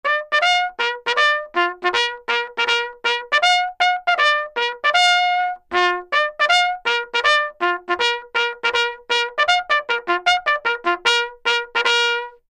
Bugle Plays To Color, bugle civil war

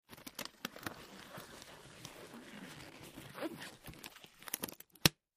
Surgical Gloves Put O, gloves

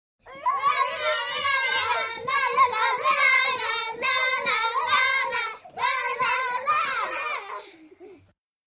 6 Yr Olds Dance Sing La

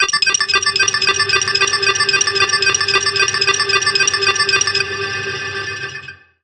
Computer Danger Warni, stinger

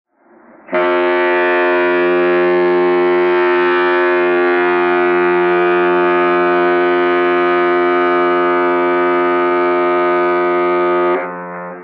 Ship Horn 2 Lng Blast, boat horns

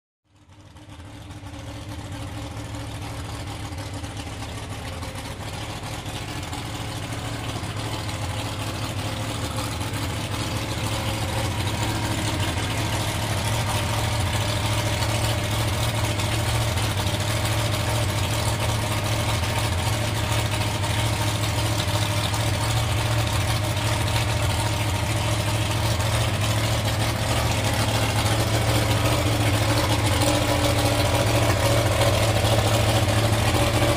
Drag Boat Idle revs

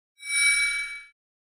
Mouse Over Elect, mouse over

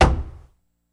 Staff Hit Single, spears & staffs

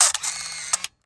35mm Camera Auto Adv

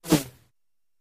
Arrow Whistle, bow & arrow

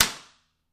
Belt Hit Slap Flat

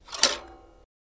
Bicycle Kick Stand Up

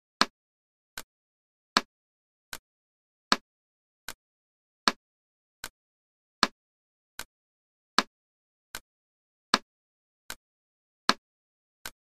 Clock Ticking Clock T, clocks

